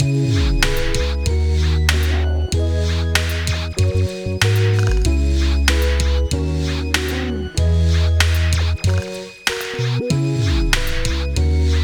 Continue; NBA in the Zone; NBA Power Dunkers; playstation

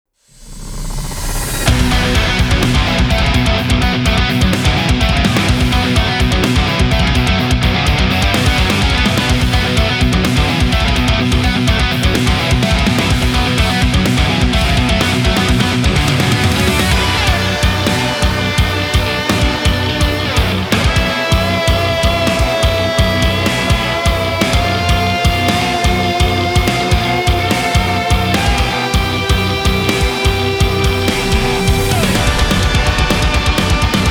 Station (Combat Phase); Astral Chain; Harmony Square

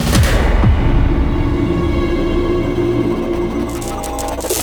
Fail Wardens

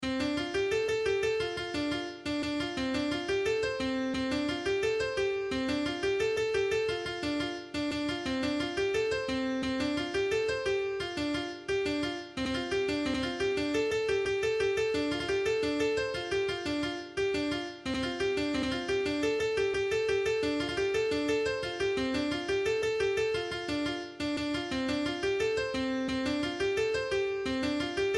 MIDI; friday night funkin vs. umbal family computer

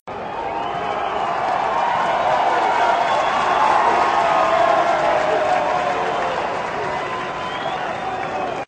Crowd; Extreme Pamplona

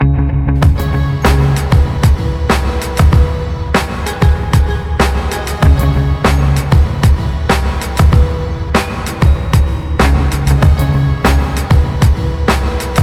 Instrumental; NBA Live 2004; playstation 2

